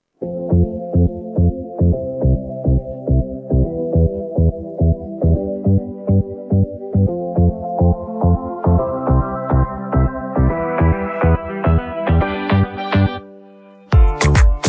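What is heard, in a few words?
backing track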